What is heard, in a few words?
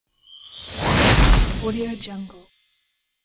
fire magic burst